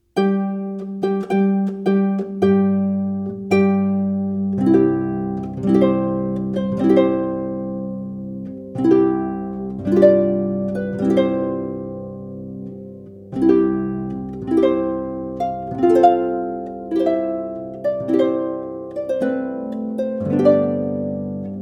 Bridal Chorus; Wagner

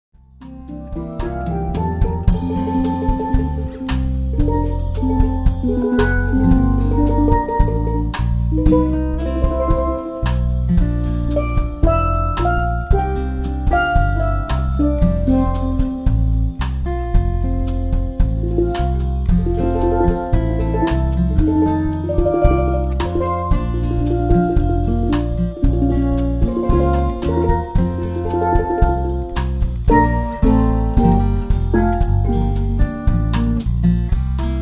Steel Drum Band

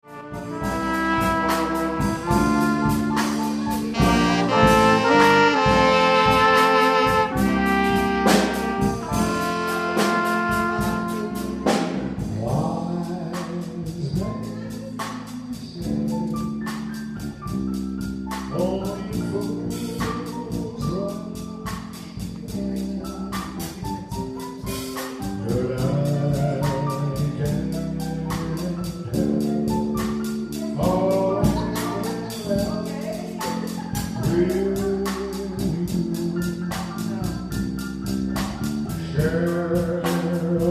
Live Band

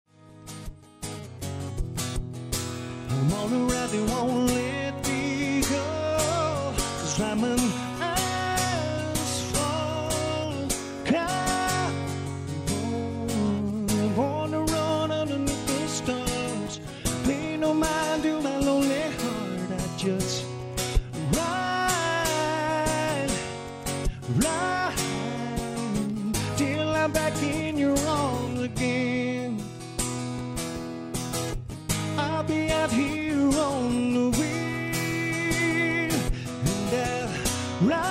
LIVE, Acoustic Guitar

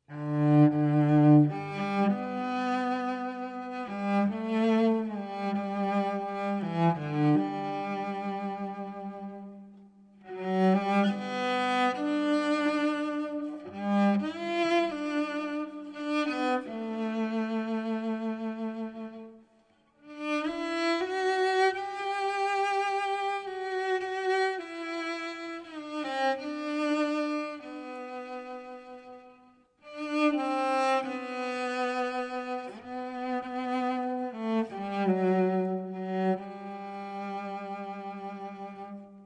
Solo cello

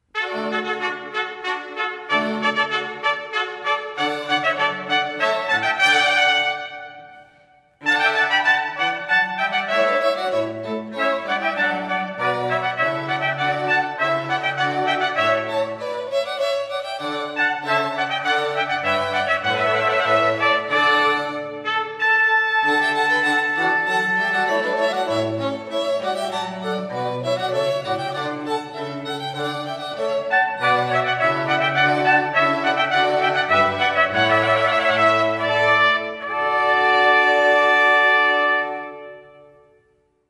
Entree String Quartet 2 Trumpets; Purcell; Classical

